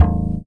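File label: bass guitar G3, jazz bass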